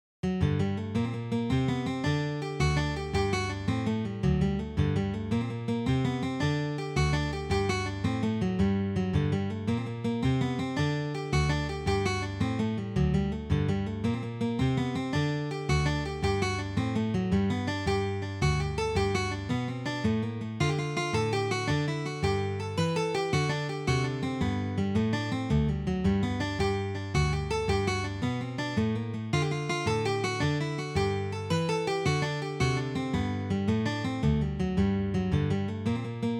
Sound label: Jig